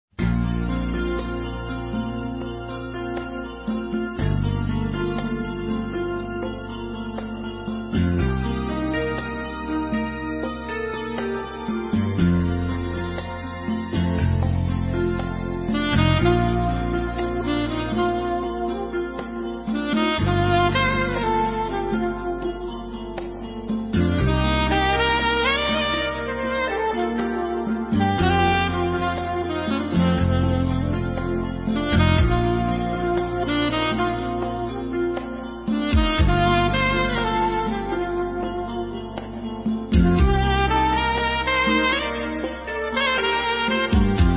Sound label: ads music